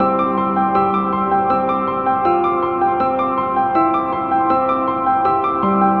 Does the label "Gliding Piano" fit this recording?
Yes